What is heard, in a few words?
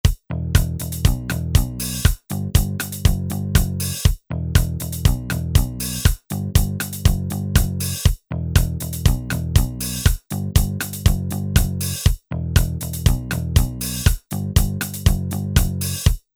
Ska